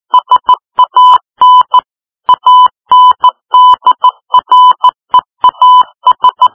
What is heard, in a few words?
morse code